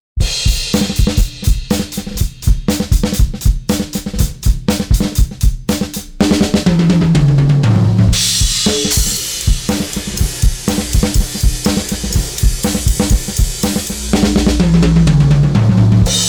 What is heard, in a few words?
drums; Nanopulse